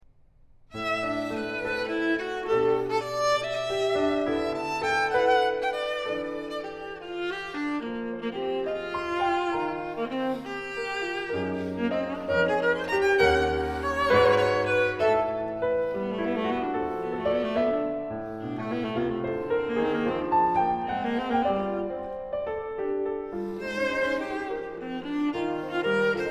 sonata for viola and piano allegretto

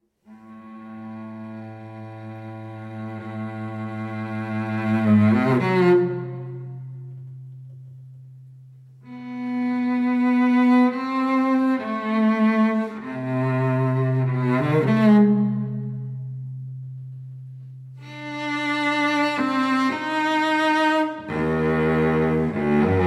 string trio

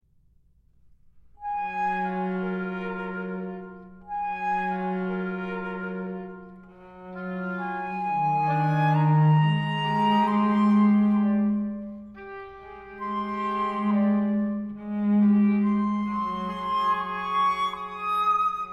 pulses; Verve Music; ECCE Ensemble; Remembrances; Chamber Music of Trevor Weston

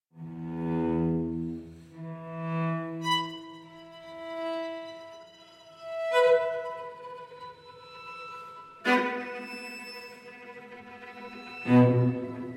string quartet; II. Gravity

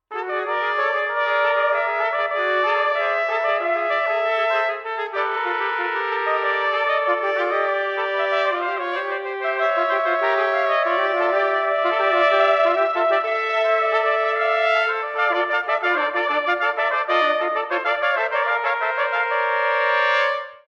allegretto, horn; trumpets; fanfanfaren ii; Andy Kozar

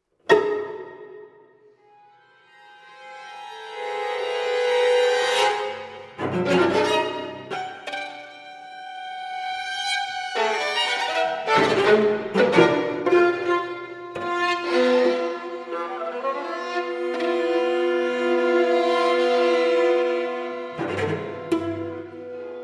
string quartet; Armenian Music Project; Armenian music new and rediscovered

